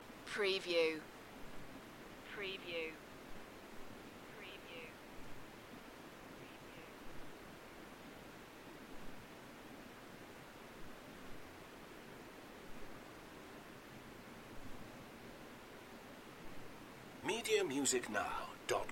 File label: Night time Welsh forest ambience with stream; beanstalk audio